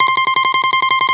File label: BALALAIKA, Prima Balalaika, Plucked Strings